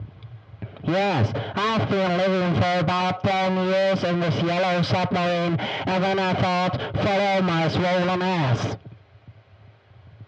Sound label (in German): submarin